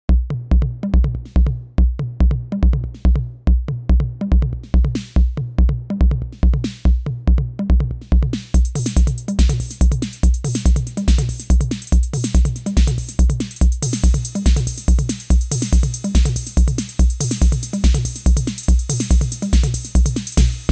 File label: TR-606